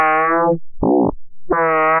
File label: Meow Fart; Vector FM Presets